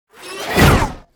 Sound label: Swoosh